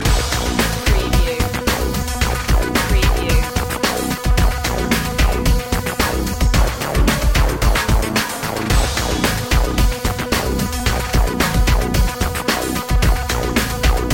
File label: underscore